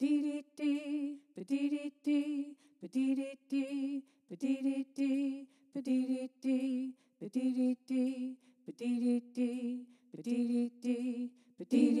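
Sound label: soprano melody harmony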